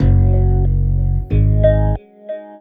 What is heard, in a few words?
BASS, Hip-Hop & R&B; USB Soundscan vol.47; AKAI